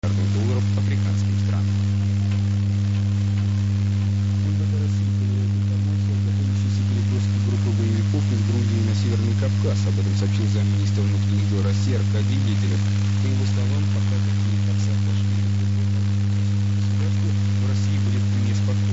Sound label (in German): radiomann KW; Sender mit russ.-sprachigem Beitrag (KW); Radiomann mit Wellenschalter und Abstimmskala; Audion-Empfänger; Anmerkung: Audion-Empfänger waren in den 1920er-Jahren sehr beliebt